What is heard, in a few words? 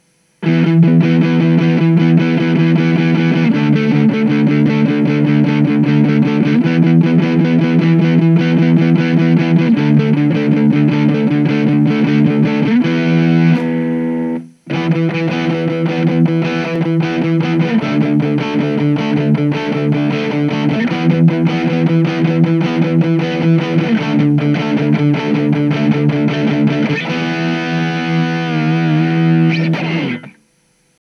nobuffer drive